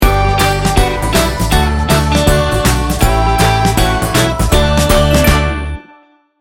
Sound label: happy hands sting bumper